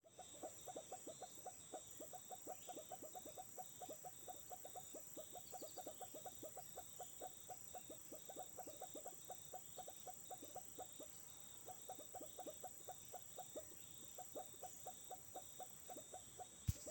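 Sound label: Leptodactylus guianensis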